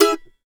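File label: mandolin E major, Country; Sampleheads; Whole Lotta Country 1 & 2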